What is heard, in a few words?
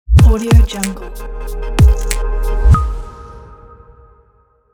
abstract media logo; sawtooz